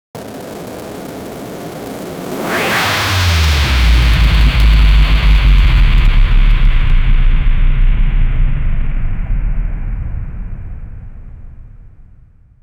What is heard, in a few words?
sci-fi explotion long